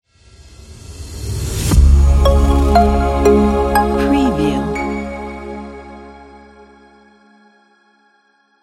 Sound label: logo intro